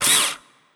Pistol Hero Motor

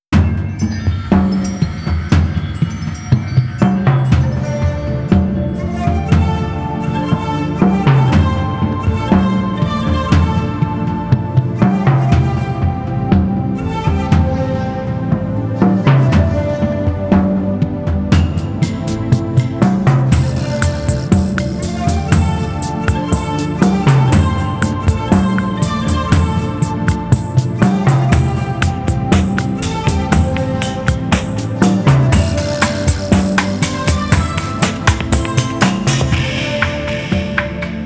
Bossa Nova; Rochard; Arizona; Videogame Soundtrack (2011)

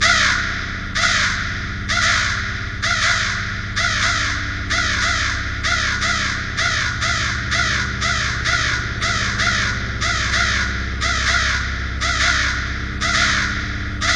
Music Loop; Infinite Hole; Music Loop 18; Xbox Series X/S

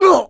Enemy Generic Human Death Crushed

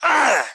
Citizen dwarf damage

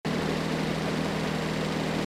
Walk; starbites

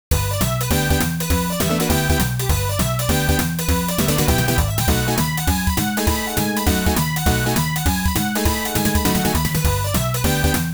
Name Register

